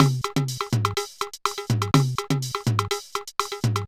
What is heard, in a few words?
Loop, mxr; classic machines